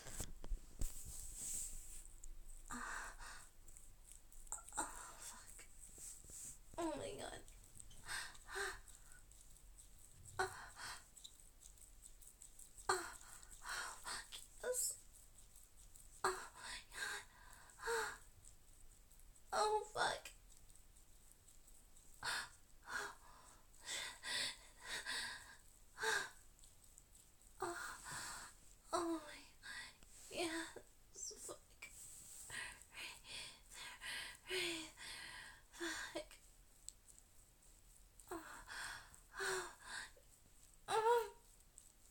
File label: wet noises; Just a quickie; for GWA